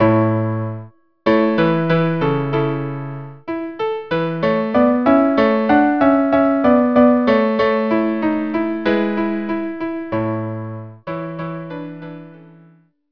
Larghetto

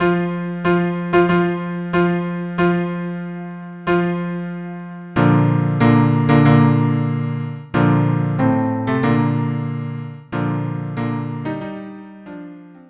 cello duo; bridal chorus

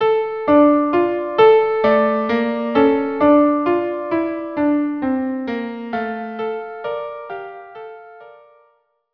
violin viola; Yedid Nefesh

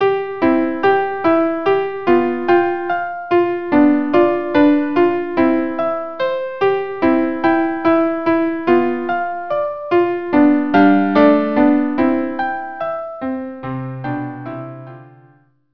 VIOLA PIANO

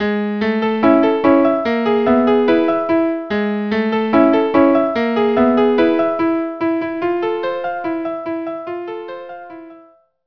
violin duo

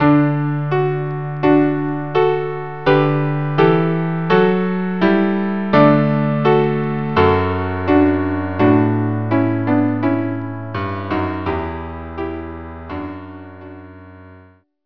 trio

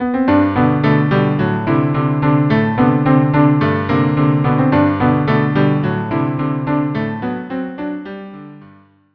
ecossaise cello duo

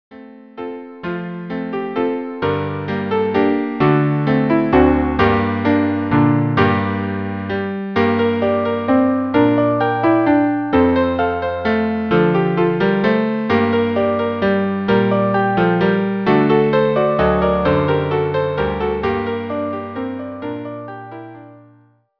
string quartet; Greensleeves variations

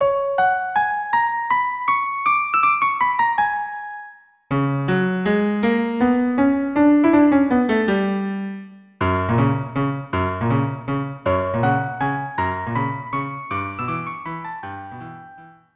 cello duo; Seguedille; Carmen

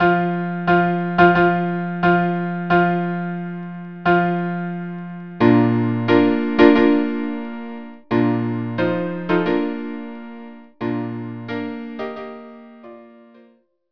bridal ch trio

